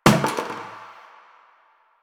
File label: Destructible Bucket Impact